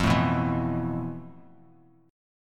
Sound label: F Major 7th Flat 5th, Bass Standard; strummed; JGuitar